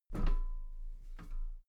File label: step metal ladder step top soft; schritte